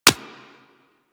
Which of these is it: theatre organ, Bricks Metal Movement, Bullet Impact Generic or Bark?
Bullet Impact Generic